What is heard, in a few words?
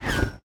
damage hits explosions, Warships; World Of Warships